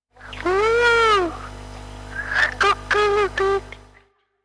Loup coq

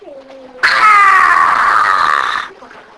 Lion

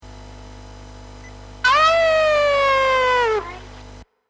loup

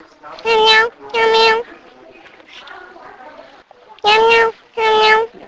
Chat

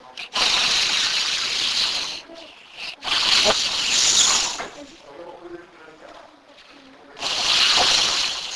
Serpent

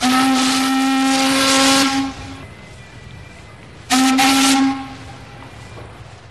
bateau à vapeur

